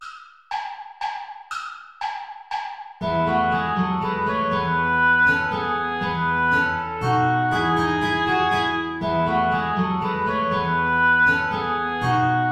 saltarello play along without guitar

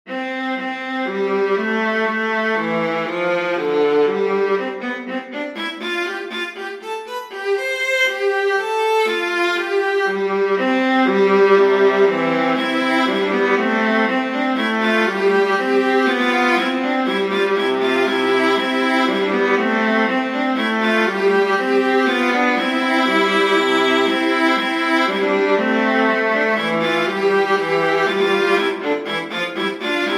minuet major for viola duet; clarke jeremiah

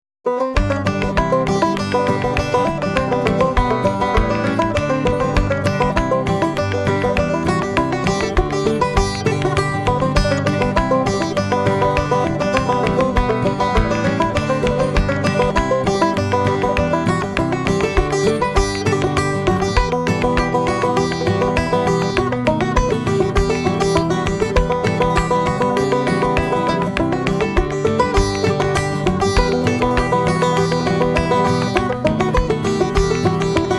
hornpipe

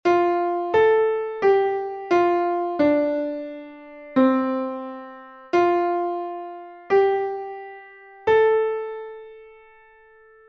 cabin song; price florence

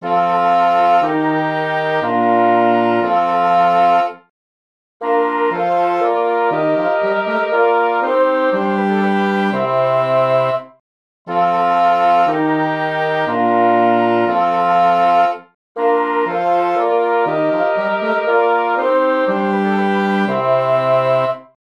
for woodwind quartet; lassus orlande de; bon jour mon coeur

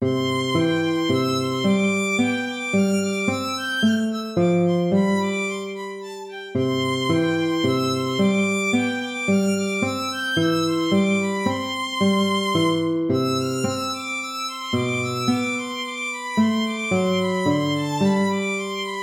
tempo minuetto; hook james

